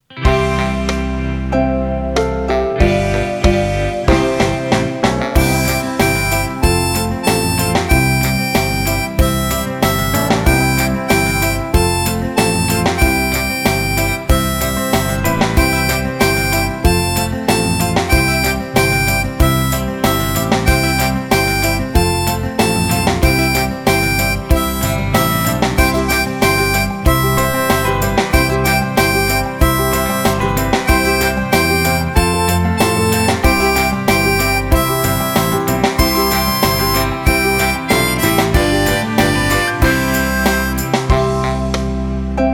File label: Harmonica to listen to, blues harmonica